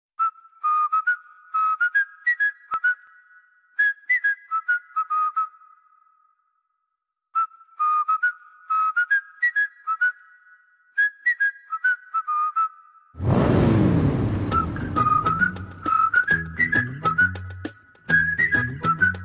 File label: Whistle Tone